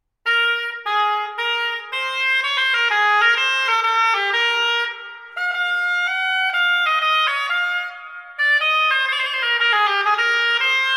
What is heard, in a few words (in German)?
musikinstrumentenmuseum schalmei